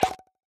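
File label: button press; Teen Titans Go! - Raven's Rainbow Dreams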